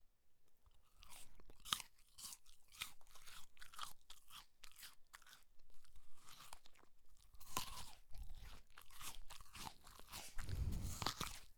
Eating

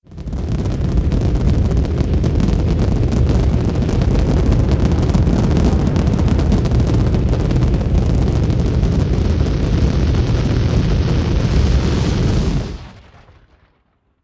Rocket Launch Roar Crackle Squawking

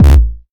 Kick

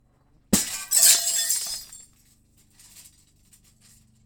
Window smash; Pennyblack movie

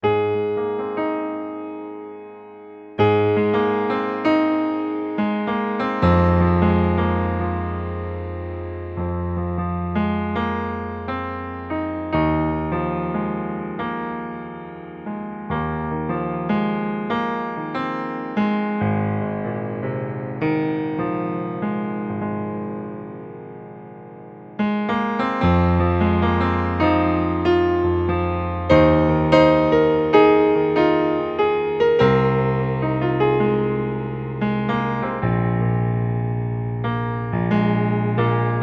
Melancholic Interpretation In G

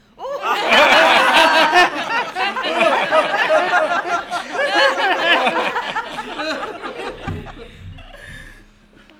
Laughing Audience Laughtrack

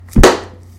Book Slam

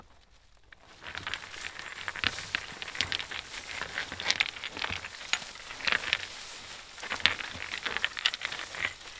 Rocks Rolling

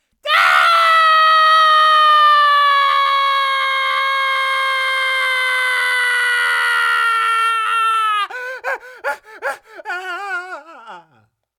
Scared Male Long Scream

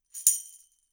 Tambourine

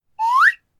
Comedic Whistle

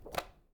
Picture Drop Heavy Paper Near Mono

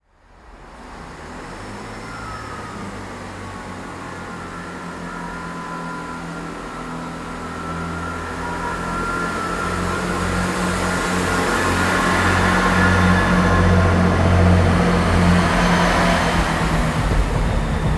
Train Passing Slowly Right To Left

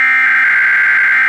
EAS Header

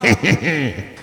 Bad Laugh

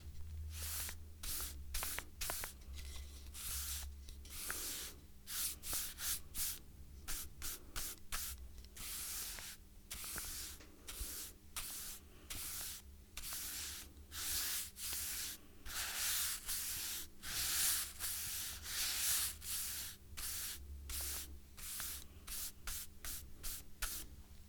Paint Brush

